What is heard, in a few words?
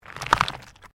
STONES MOVE